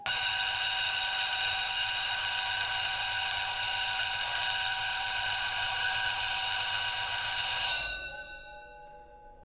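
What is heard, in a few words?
electric school bell ringing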